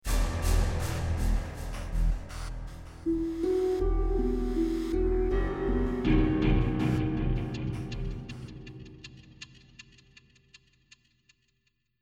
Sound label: territory stinger; inFamous; PS3